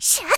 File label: attack; Eternal Return; Tia; Voice Line; KOR